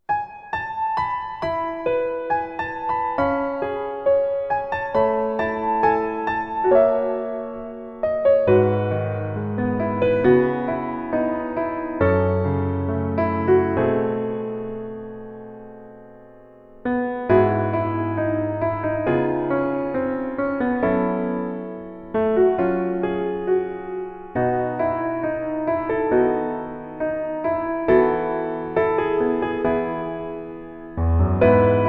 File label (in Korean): Piano ver, 멜로디MR, -1키